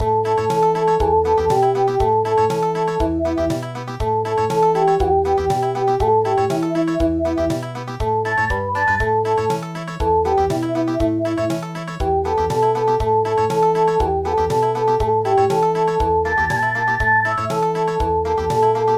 Country

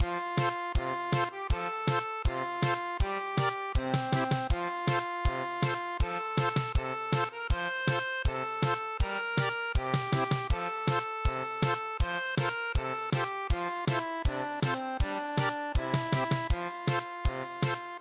German Polka

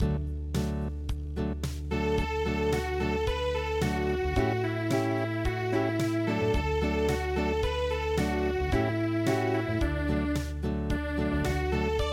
Unplugged

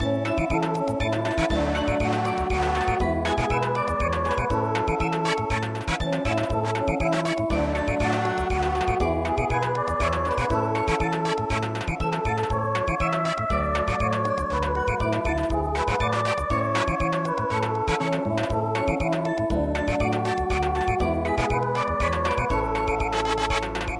Samba, traditional

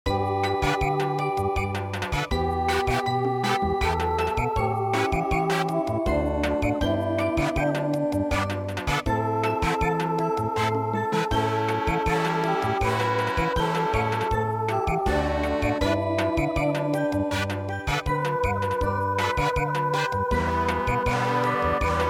Samba

